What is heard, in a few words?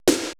PERC MIX, Retro Sampler; Best Service ProSamples vol.55; AKAI